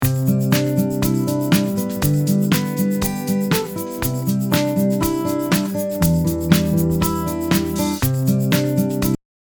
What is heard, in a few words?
Outro Music